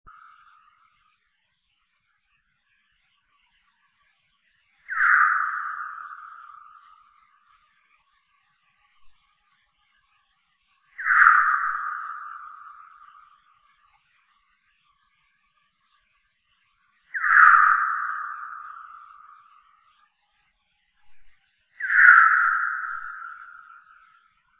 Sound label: Tadarina australis